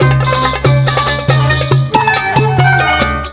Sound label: Oiental; Best Service; Ethnic Journey Sound Cube